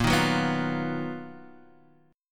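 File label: B Major Sharp 11th, B flat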